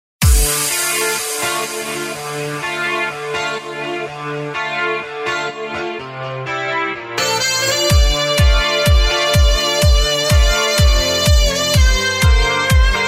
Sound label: Sax Mix